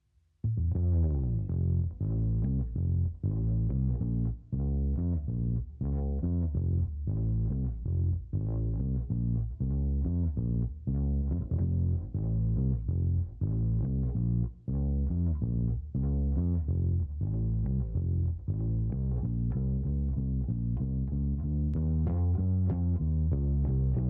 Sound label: Bass